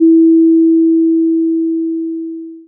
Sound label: percussion envelope